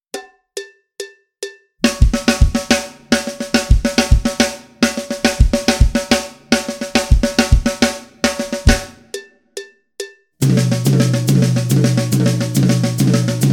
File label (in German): Triolische-Vokabeln; Schwarzes Album; Bum Bum Tschack Grooves Fills